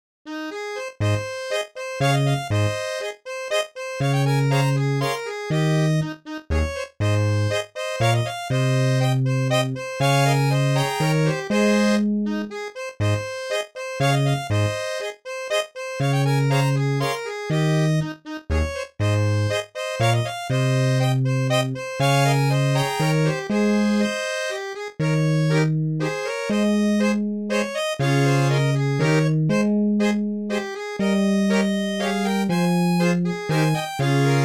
mazurka sol diese

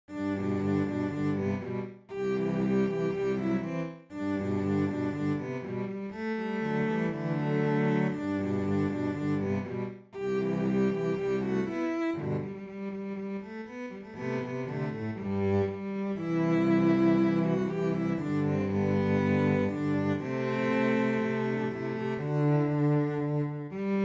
polkacella violoncelles

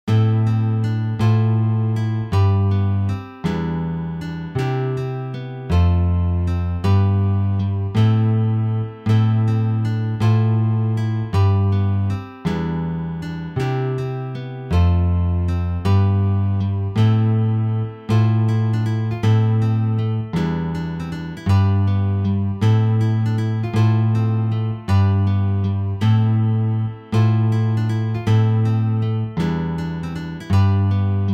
guitare

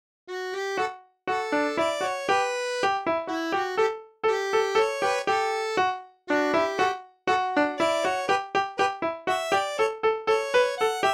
mazurka auvergnate

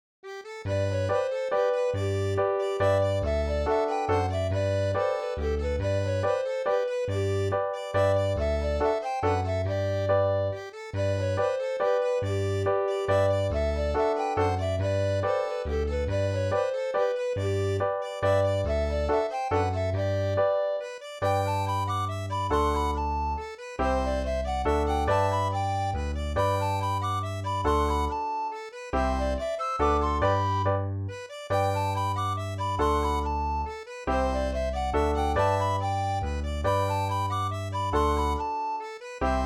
mazurka; du grand frise

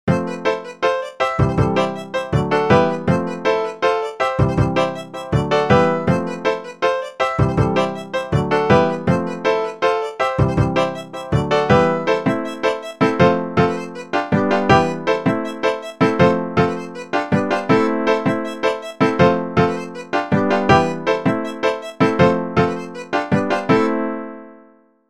laride 8 temps

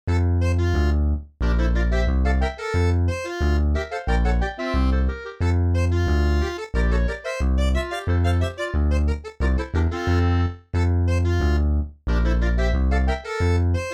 instrumentale; la bonne soupe

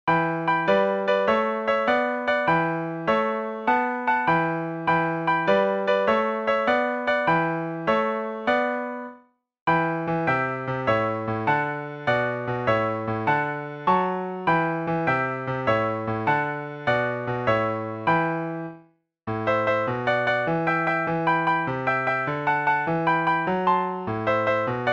piano

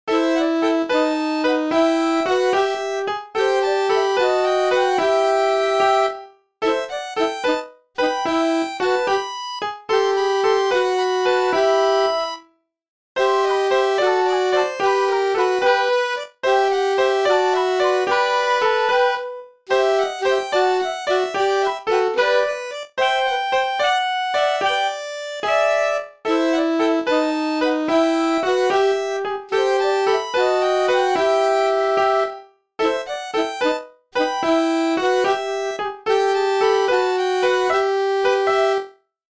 pont piano flaclasax

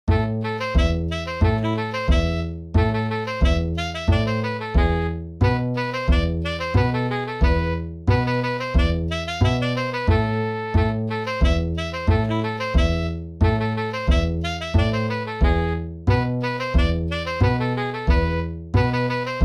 1 voix; esperanza

